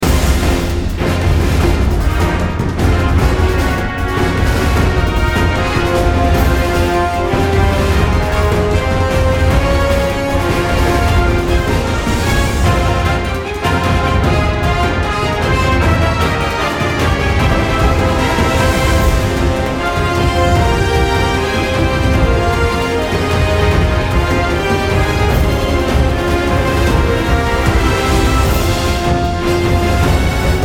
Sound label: battle boss